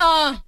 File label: uh